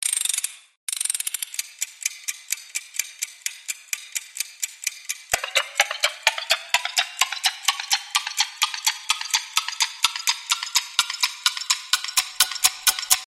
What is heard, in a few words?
newest iphone 7 tone ringtone